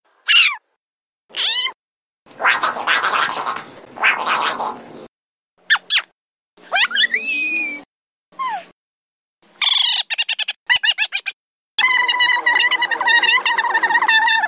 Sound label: Funny Cute Sounds